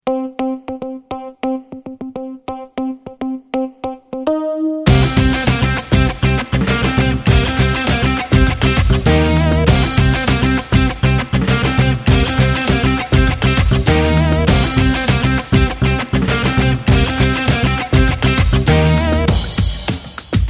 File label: chase rock